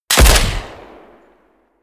shot

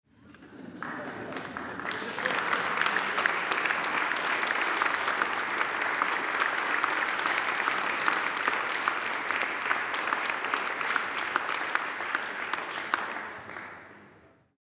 applause with laugh

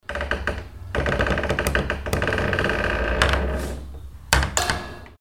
door open squeal

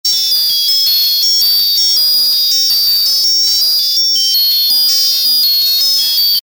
highfrequent agressive signals

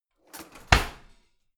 old drawer in half hard

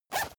zipper use short fast

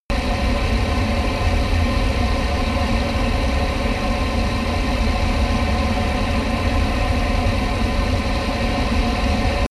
plane airbus a320 turbine idle close

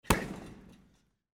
boxing single punch

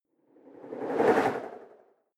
whoosh simple flutter phase

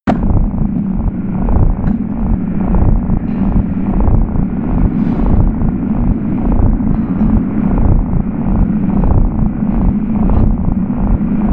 atmosphere industrial hypnotic low

